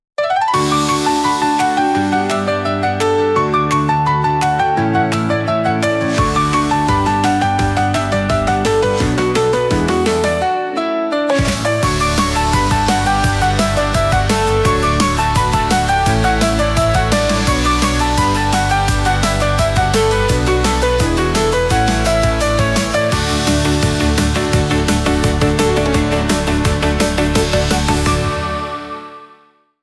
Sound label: 170 bpm Piano Synth; 29 sec; ai social media music